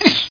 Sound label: Sec groan; The Crow City of Angels